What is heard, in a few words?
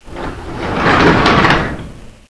door move; svencoop; aomsounds